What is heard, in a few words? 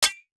metal snare mini